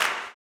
CLAP, Blues Soul; Spectrasonics Vocal Planet

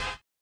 collision; Spectrasonics; Metamorphosis

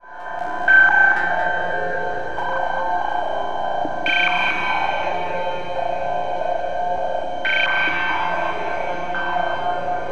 Ear Fuzz; Satellite Hive

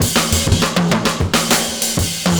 FILLS, 70_s Breakbeats

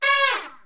Big Band Fast; Roland L-CDC-01

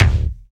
KIK BASSY, Dance Kicks; Roland L-CDX-01

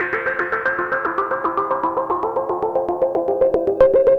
synth arp; Electrocution

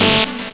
chord 1, sound chord; Ueberschall; Techno Trance Essentials

